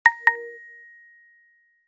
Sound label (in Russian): standartnyj zvuk na sms soobschenie nokia message